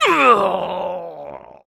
Player Death; AudioWizards

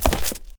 sfx dodge; Blind Gladiator

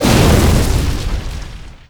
Explo Medium Fiery Burst; Destruction

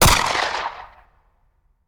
Rifle; Sound Ideas; Guns

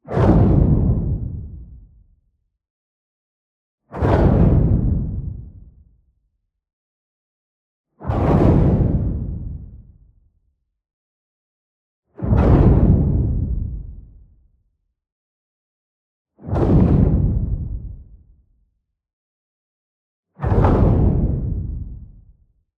WHOOSH MEDIUM Diffused Air; boom library; Matter

